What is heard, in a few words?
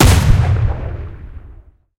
mollins 57mm shot, guns